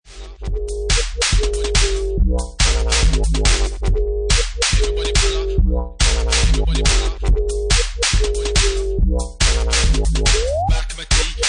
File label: Bassline Mayhem